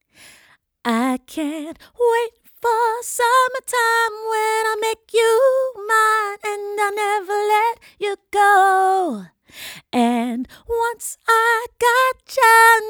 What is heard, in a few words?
female vocals; lewitt lct-240-pro; студийным микрофоном